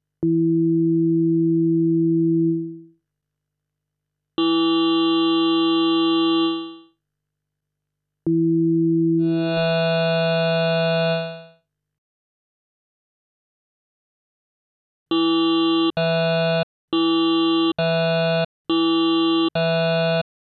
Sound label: PRO-2 wavetables Mellow vs Tines